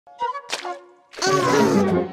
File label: nibble nom pfffttt